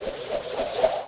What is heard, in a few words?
throw rope